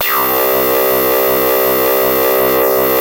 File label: MS20, LEADS; Masterbits; Vintage Voltage